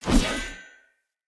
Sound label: Short Sword Heavy Whoosh; Weapons; Swords; Sollos